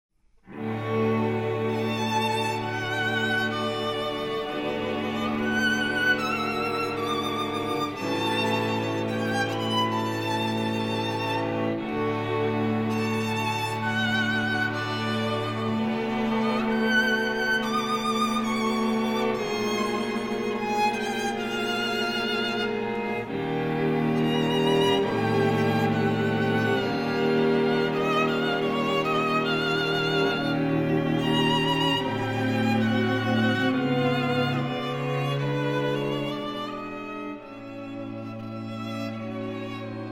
Quartet for Strings in D major; Franck

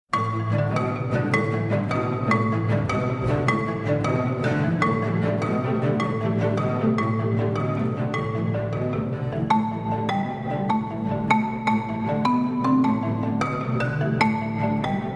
Concerto for marimba; Rosauro

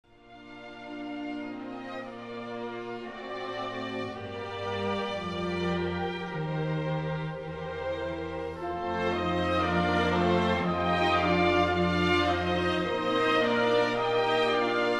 Concertos for Piano; Sondeckis; Mozart

